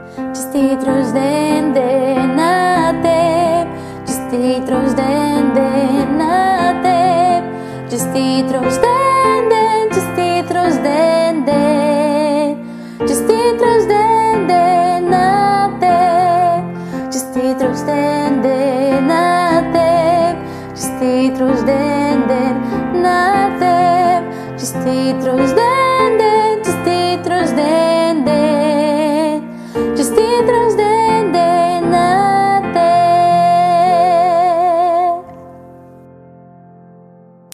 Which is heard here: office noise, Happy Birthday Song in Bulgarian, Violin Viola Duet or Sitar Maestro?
Happy Birthday Song in Bulgarian